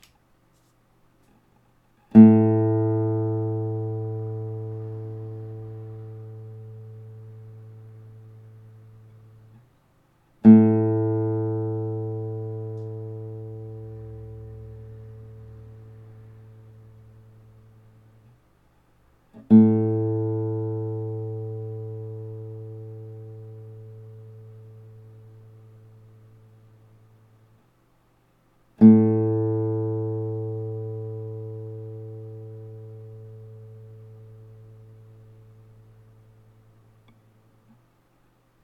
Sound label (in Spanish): Sonido de guitarra española cuerda 5 La A; afinar una guitarra